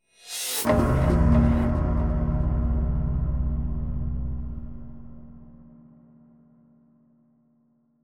Stab

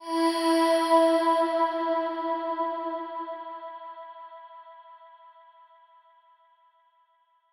Chime; chime sharp

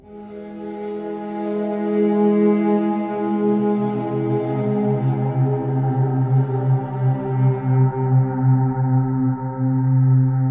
Atmos

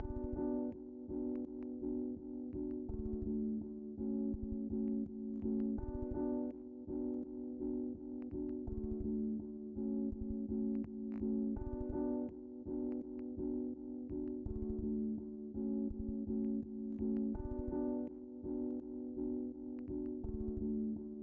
Piano Looped

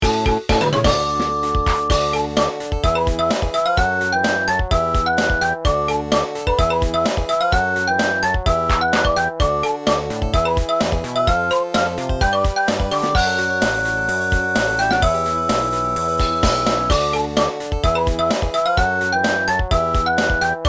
Battle Victory Trainer

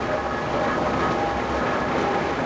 Broyeur Loop

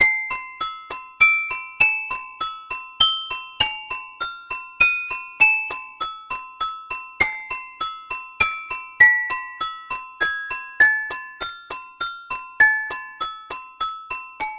Hangman Musicbox Tune; Chapter2 Birdsnest

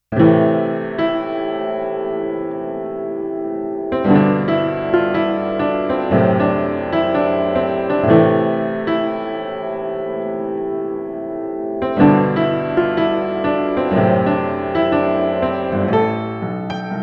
Spooky Jazz Piano No Reverb; Club Penguin original soundtrack 2005-2017

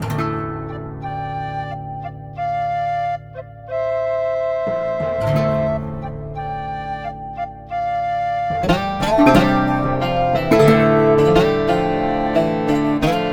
Victorious Evil; Mount & Blade: With Fire & Sword

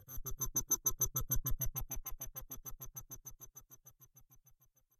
Feedback Pulse

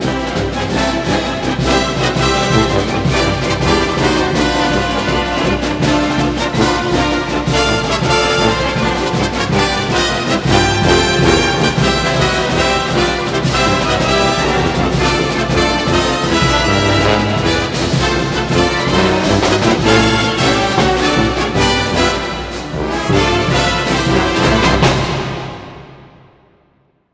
Fight Song

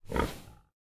pig Snort; Atv Punchpig; Super Mombo Quest

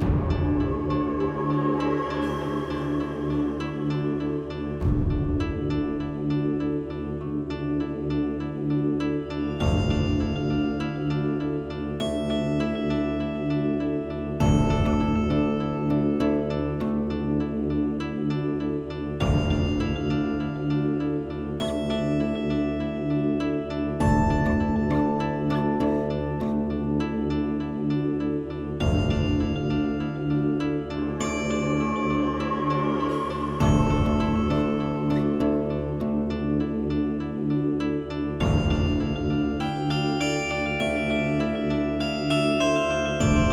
Howling caverns; Cendric

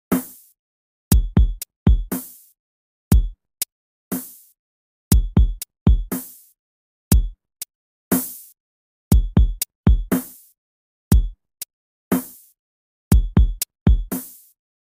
Drum; Drum Cat; Plasma Island

